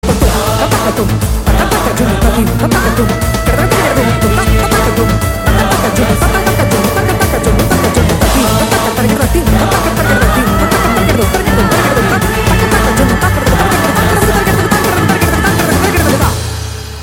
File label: Humming